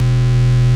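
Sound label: DISTORTED SYNTH E-1; AKAI S-Series CD-ROM Sound Library VOL-7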